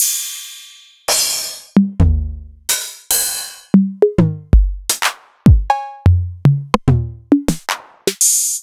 Novation Drumstation

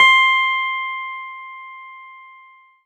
CLAVI, Disco House; Best Service Dance Mega Disco House; CLAVINET

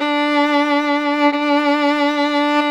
VLN D3; Sonic Reality; American Heartland

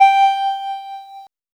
LEAD R; AMG - Vince Clarke Lucky Bastard

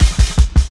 Shuffling Live Cut, Jungle Drum Loops; Zero-G Jungle Warfare 1, 2 & 3; 90 s Sample CDs

